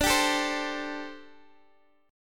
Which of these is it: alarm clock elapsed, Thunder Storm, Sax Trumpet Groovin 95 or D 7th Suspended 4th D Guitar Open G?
D 7th Suspended 4th D Guitar Open G